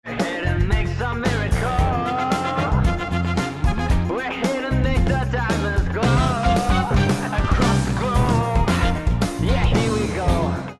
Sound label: bomb ten sec count; music kits custom